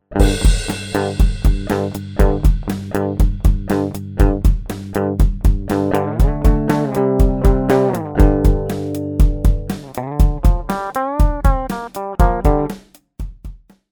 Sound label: Bypass